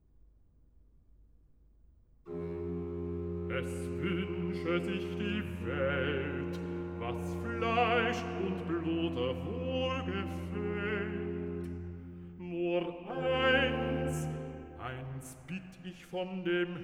Recitative Es wunsche sich die Welt (Ba; Singet dem Herrn ein neues Lied, BWV 190; Bach; Robin Blaze; Cantatas, Vol. 21

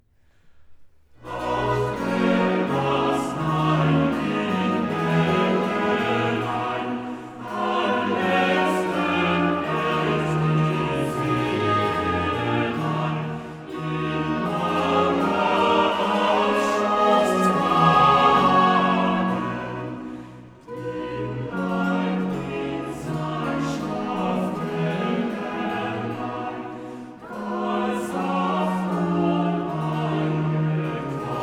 Chorale Ach Herr, lass dein lieb Engelein; Man singet mit Freuden vom Sieg, BWV 149; (Chorus)

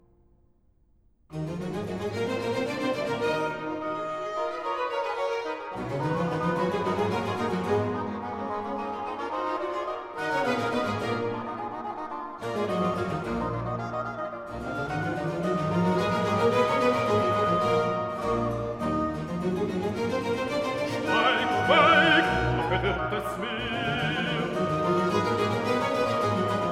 Aria Schweig, aufgeturmtes Meer! (Bas; Jesus schläft, was soll ich hoffen, BWV 81; Bach; Robin Blaze; Cantatas, Vol. 21

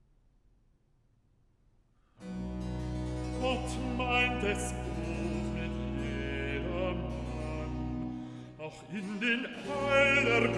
Recitative Gott meint es gut mit jedermann (Bass; Ich habe meine Zuversicht, BWV 188

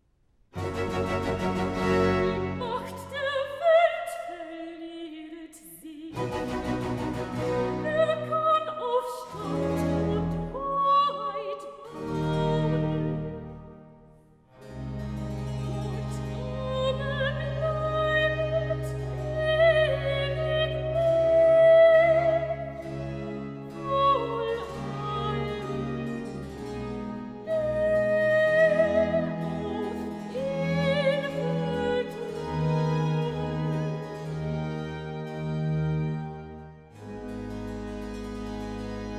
Recitative Die Macht der Welt verlieret sich; Ich habe meine Zuversicht, BWV 188; (Soprano)

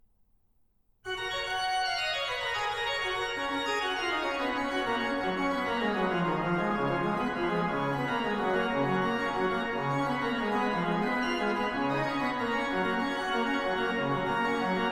Präludium und Fuga in G BWV 541; Bach

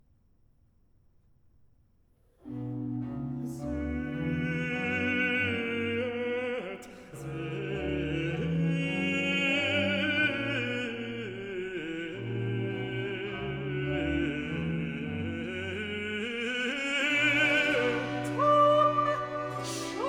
Arioso and Recitative Sehet, wir gehn; Sehet, wir gehn hinauf gen Jerusalem, BWV 159

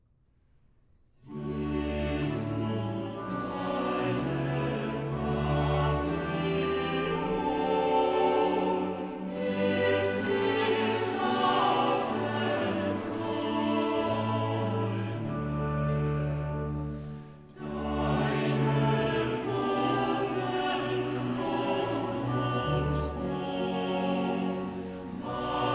Chorale Jesu, deine Passion ist laute; Sehet, wir gehn hinauf gen Jerusalem, BWV 159; Jesu, deine Passion ist lauter Freude

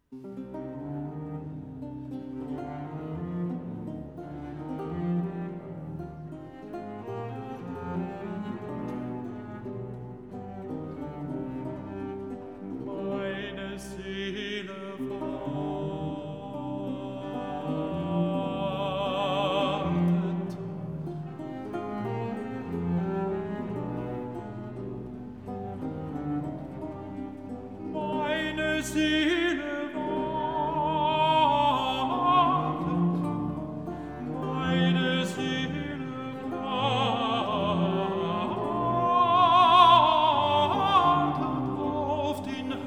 Arie Tenor mit Chor; Cantata No. 131, 'Aus der Tiefen rufe ich, Herr, zu dir' BWV131; Tenor mit Choral; Bach; Philippe Herreweghe; Cantatas

